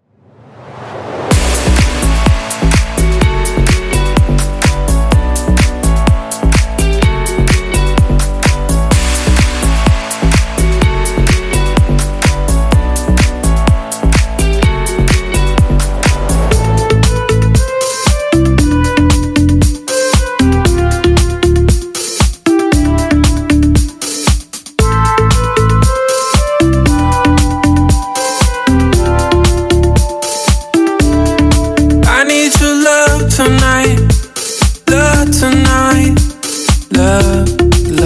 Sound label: Modern Pop; Noiiz; Ship Wrek